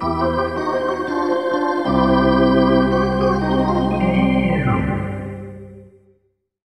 Hockey Organ

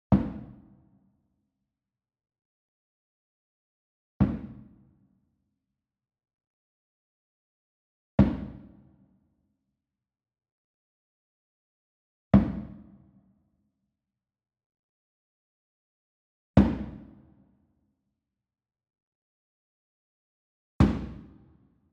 SMALL DRUM Tabla Hit Soft Beater Medium; boom library; Cinematic Strikes Construction Kit

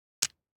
DICE on Felt, Grab, Standard, 2 Two Dice; Samples; ArticulatedSounds; DICE Sfx Party (Extended)

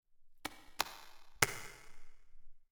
Lurker Steps

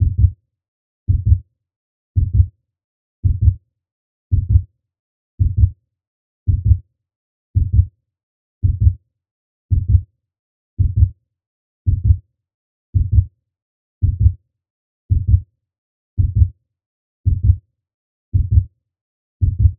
Heartbeat Fast, Medical; Blastwave

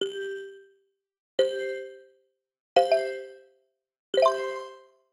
Digital Click Positive

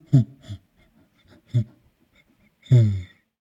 Monkey Lose; Pitch Black Audio Pong

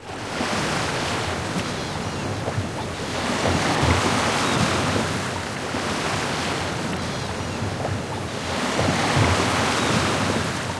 SEA; XXL 800 Production Effects

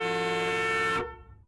horn car; Blind Drive

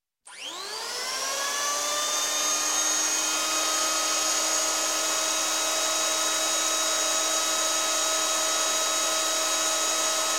Construction, Plane Electric Plane Start, Run; Stop, Hand; Sound Ideas 2000 Series

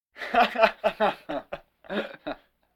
Vocal effects breathing Moaning Baby

